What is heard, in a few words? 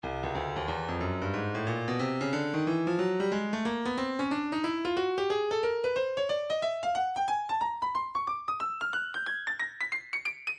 stylus breakbeat midi 091 bpm; Urbadub